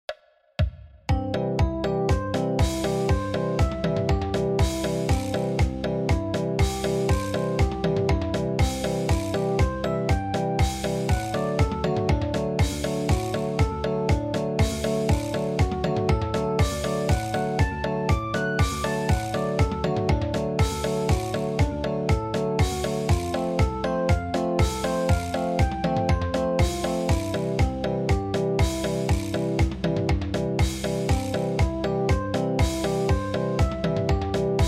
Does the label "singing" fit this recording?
No